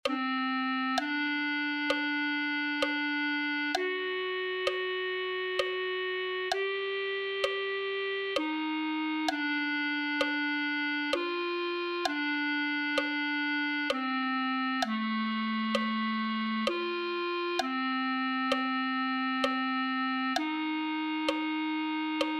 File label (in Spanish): clarinete1